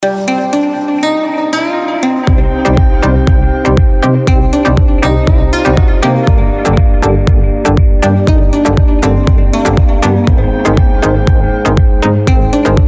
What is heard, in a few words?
beautiful instrumental ringtone; android